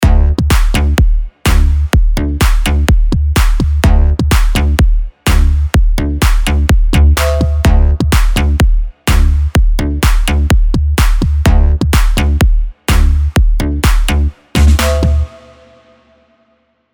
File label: Future House Bass House; Nexus 3; Future House 2